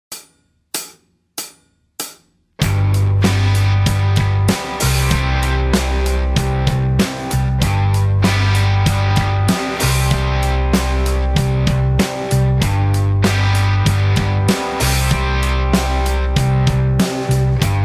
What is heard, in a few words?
Acoustic backing, Guitar; Guitar Techniques 2017 Issue 266 March